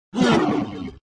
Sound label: hit; shouLieGaoShou350; juren